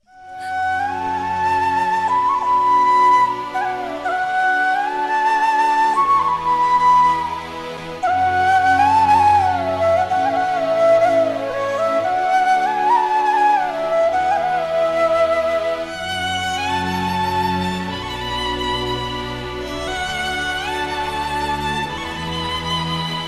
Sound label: Heart Touching BGM Flute Violin Background Score; paradesi movie